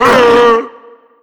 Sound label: pain, zombie vox; creatures